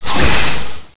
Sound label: napalm